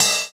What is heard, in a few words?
Cymbal, JUNGLE BREAKS DRUM SOUNDS